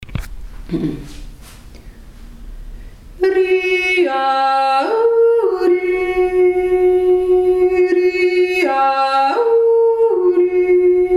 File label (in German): mittlere stimme; reiberer